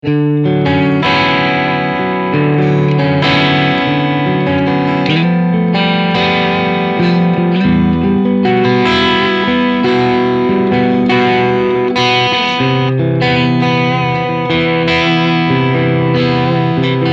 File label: Ch1 Crunch